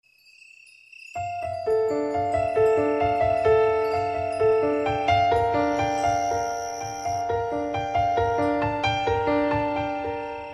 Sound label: Light Ringtone